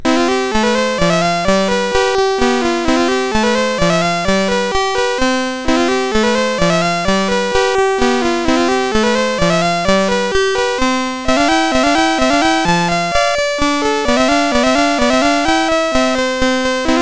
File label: Rock Fall, MOS6581R4